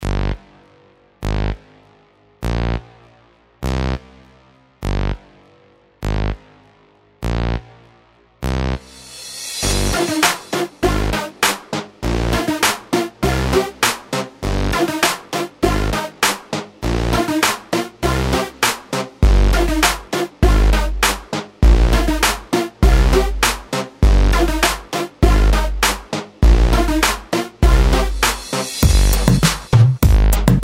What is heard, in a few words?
electro